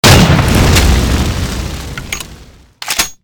cannon, weapons

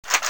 vsk draw

